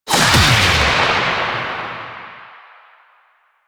Choir Erupt Alt Fire; Warframe

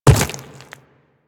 Quadruped Medium Land Default, Footsteps; Warframe; Infested

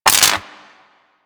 Ocean Electric Surge Small; Warframe; Grineer Ocean

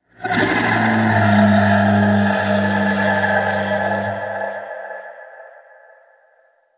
Lure Call Success; Warframe; Hunting Critters; Undazoa

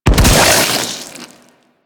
Secondary Barrel Explosion, Weapons; Warframe; SU Modular Guns

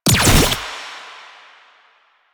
Gunbrella Alt Mech, Weapons; Warframe; Tenno; Koumei

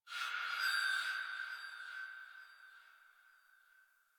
Texture Interior Medium, Ambience; Sentient Lair; Warframe

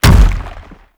Biped Step; Warframe; Man In The Wall

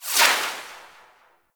Tendril Grasp Weapon Despawn; Warframe; Broken Frame

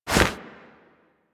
Holster Weapon

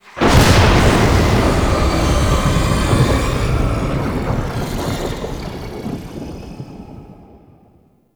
Murmur Exterior Thunder Clap A; Warframe; Ambience; Duviri

